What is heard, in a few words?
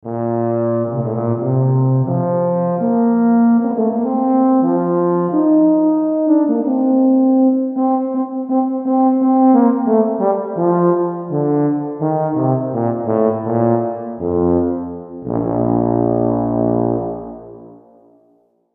pure space tuba